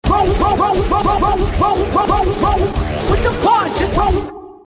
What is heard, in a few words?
scratch roll; Strong Bad's Cool Game for Attractive People